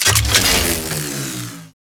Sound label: laser power down; Ambience; Gameplay; Warframe; Corpus Gas City; Gameplay Remaster